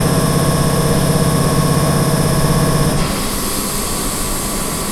train motor on halt

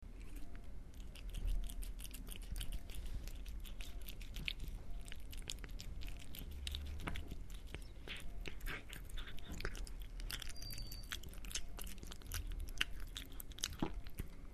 cat eating

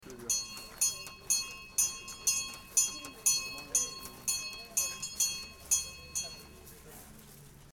school bell

